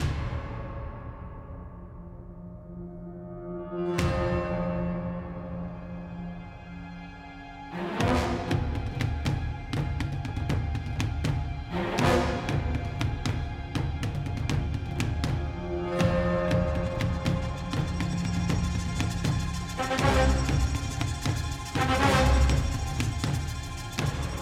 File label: Spooky Ship